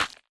Weapon Bone Big Stinger

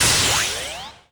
Team Ball Fly

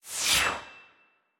Shuriken Answer

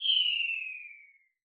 Float Leave; SE Manzai#28 (SE138 Float Leave)

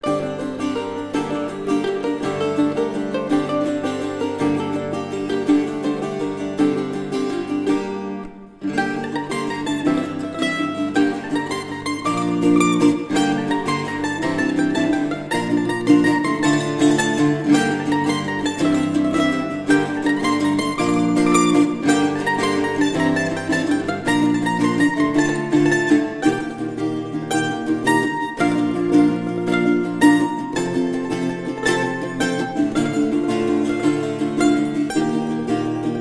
Tavern; Kingdoms of Amalur Reckoning

